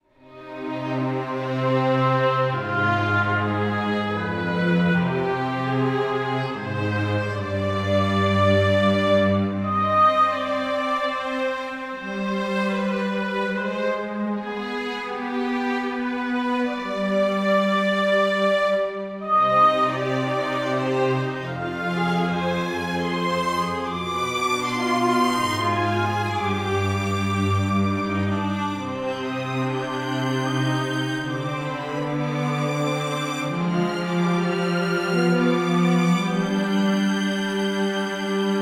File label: sad strings, music